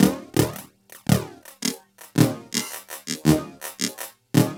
LOOP, TECHNO; TECHNO-BOX; ELEC-LOOPS

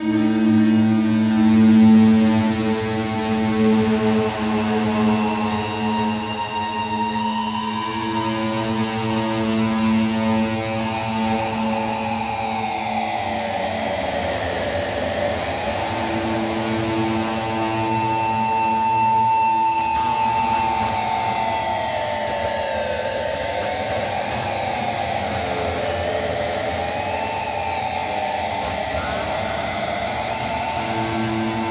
guit long drone; Sonic Foundry (Sony Creative Software); Ugly Remnants VOL-2; UR 2 FX (Long)

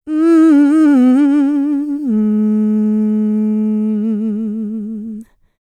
LICKS, Vocal Planet, R&B; ILIO; Dance; LT R+B

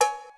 Cowbell, Percussion; Giga Samples Collection; ELQ-DrumQit

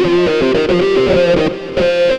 xtra lead

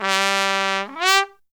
TRUMPET FX, Phantom Horns; Zero-G

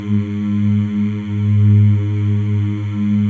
male; Spectrasonics; Symphony of Voices

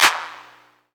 CLAP, Dance Drums

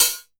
hat, drum; Masterbits - Total Zone

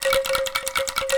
C4 ANKLUNG, JAVA ANKLUNG; WorldSounds

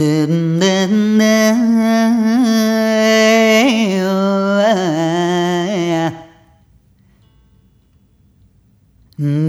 Rhythmic A

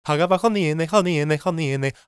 GAELIC, World Voices; ILIO; Vocal Planet

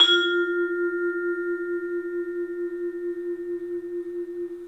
bell; 3D Sound; 3D Keys VOL-1

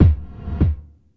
Gated Kick, Gated Reverb; Univerb; musicradar

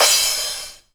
CRASH, Dance Mega Drums; Best Service; CRASHES

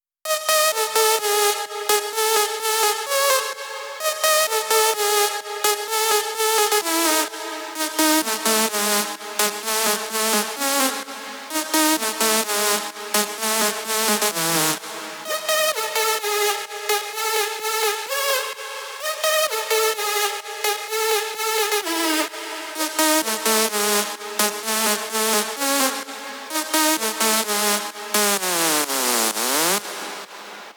128 BPM Drop leads SC, Synth, Root G; Vengeance; Essential Clubsounds Vol.5; Demo Constr Kits; Big Line Kit

